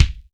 MG KICK, kick

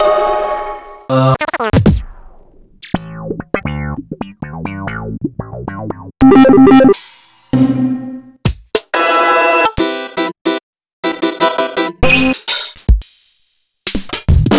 Akai MPC2500 internal

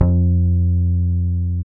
Basses, Roland

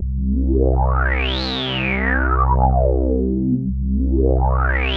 Korg POLY800 C1; Back In Time Records; Korg Universe VOL-1

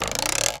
RULER; Rarefaction; Poke in The Ear With A Sharp Stick

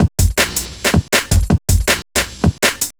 Beat, Drum N Bass

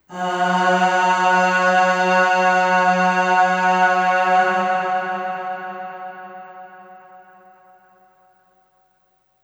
Aah Long F#2 Long Reverb; Harmonic Building Blocks

